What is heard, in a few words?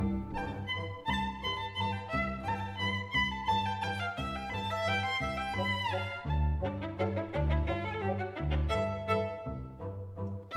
valse